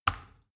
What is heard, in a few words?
plastic button tiny push heavy